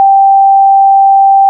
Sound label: sine